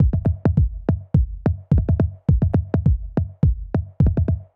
105 Loose Grooves, Percussion; Touch Loops; ElementsOne; Abstract Rhythms

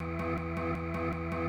Industrial 160

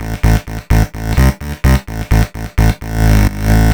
bass 128 Am; Electro; bass loops; Anthemic

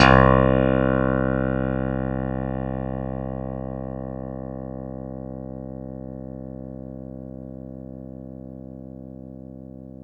Clavi, Digital Keys; Nucleus Soundlab; MK Digital Keys; MKClavi S

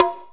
BONGO

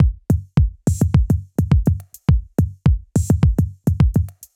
105 Loose Grooves, Percussion; Touch Loops; ElementsOne; AbstractRhythms

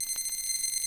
ELECTRIC TONE; ELECTRIC-SET

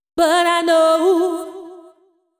deep house female, 125, A, vocals

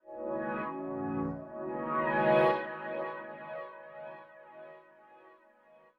SYNTH PAD ETHNO 160 C, Sphere

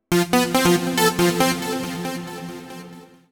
TECHNO SYNTH 140; Keys

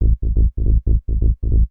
TECHNO BASS 140 A

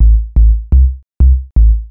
HOUSE BASS 125 A

